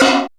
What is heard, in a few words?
Perc FX, Percussion; Dance Synths